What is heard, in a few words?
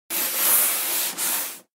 eraser; پاک کن